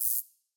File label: Electrical Sparks